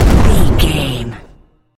explosion large

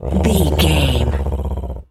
cat purr deep

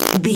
fart squirt

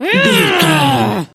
voice female growl

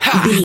voice male effort short jump

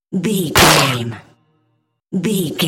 dramatic hit bloody bone

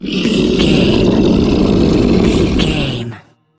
troll monster growl

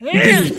voice female attack

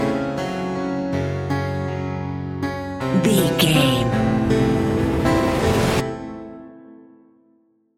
modern horror stinger

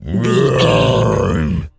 zombie voice grunt

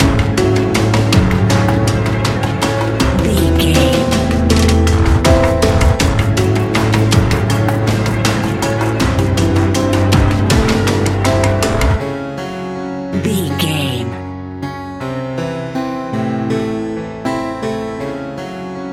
modern horror

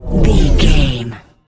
sci-fi power down object

